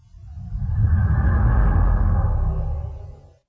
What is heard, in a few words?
cloak, klingon technology